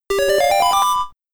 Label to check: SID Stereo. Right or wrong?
Right